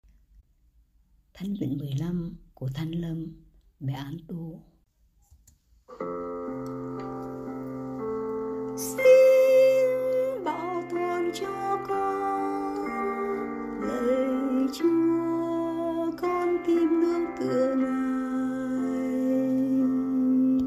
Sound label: Alto